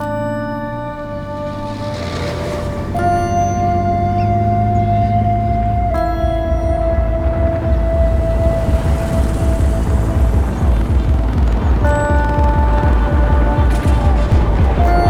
Music Loading; MP Journey

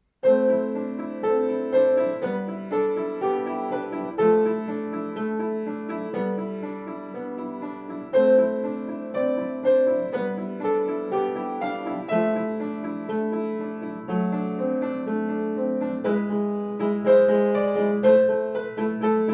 Underwater Palace, piano; Final Fantasy I

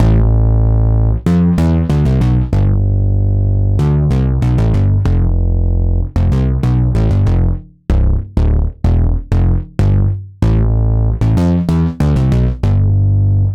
Poly Bass; super crush k.o.

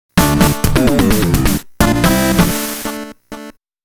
Stage Clear; ROM Cassette Disc In SUNSOFT

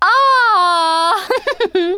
Emote Cheer; the princess and the frog; Twitch Skijump; Tia

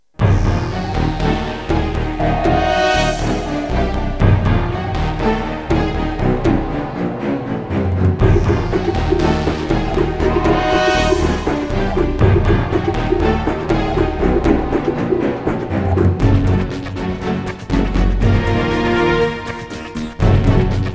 suspence; Chrome

